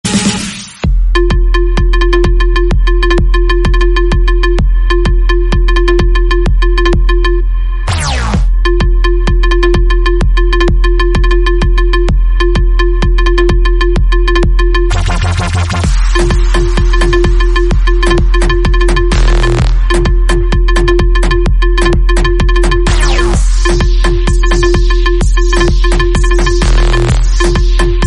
dubstep remix ringtone

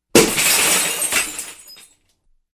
breaking glass notification ringtone

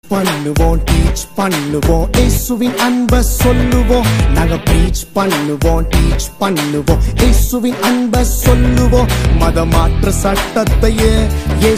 tamil ringtone

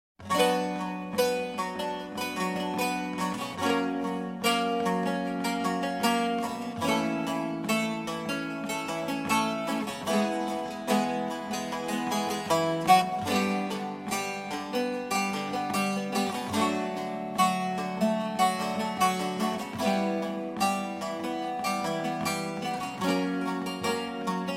music ringtone

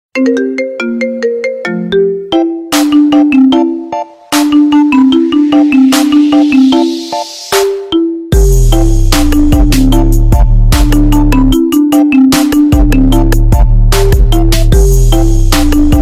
marimba remix ringtone

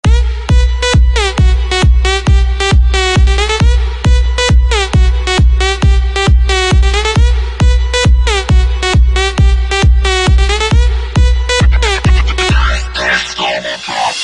electro house ringtone

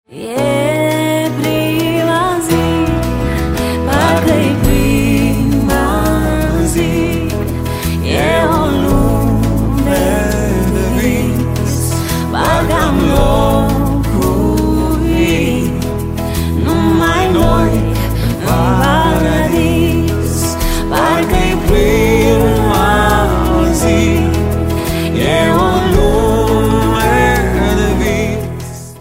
romanian ringtone

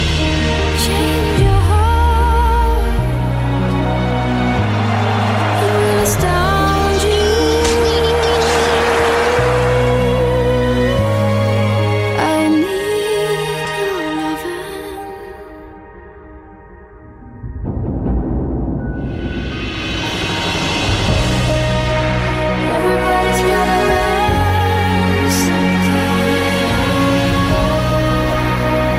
huawei p9 ringtone

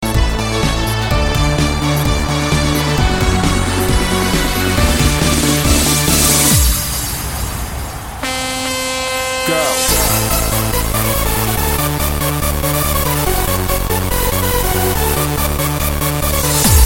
top norwegian ring ringtone

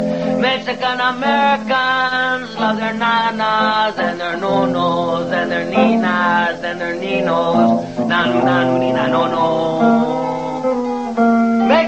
mexican american tune ringtone

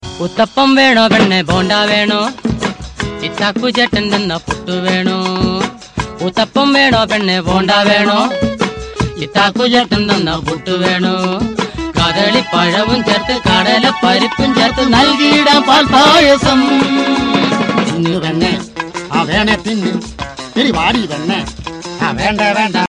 malayalam funny tune ringtone

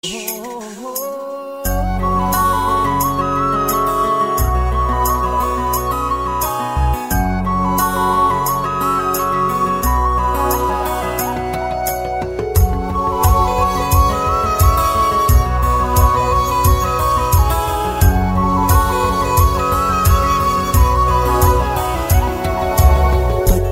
classical tone ever ringtone

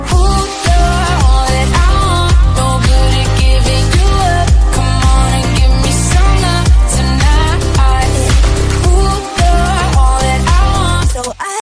electro ringtone

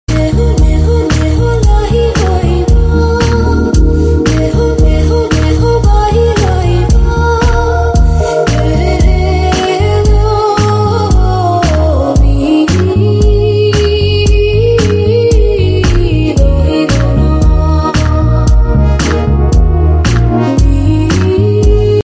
flute music ringtone; huawei

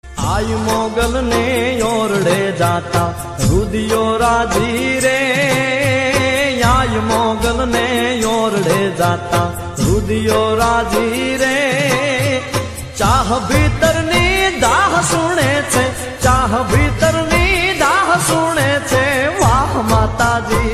gujrati ringtone

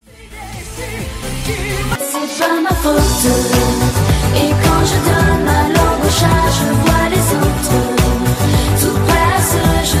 french ringtone

